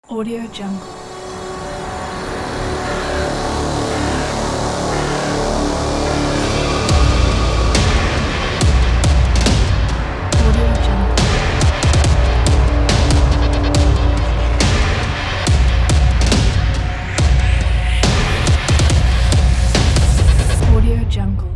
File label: epic intro ident